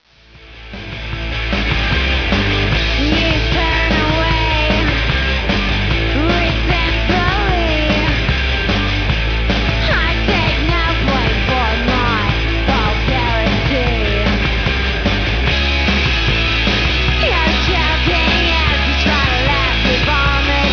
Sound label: Scream; from Black Eye